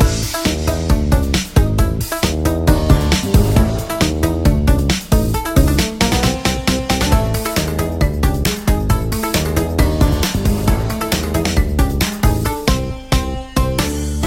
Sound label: egyptian; Crash